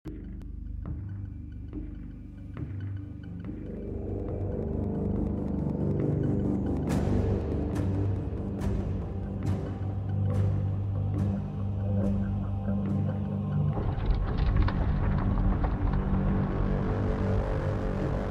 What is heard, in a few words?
Ambient; Battleborn; The Heliophage